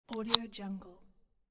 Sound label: plastic push button